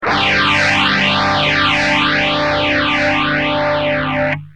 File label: gtr multivox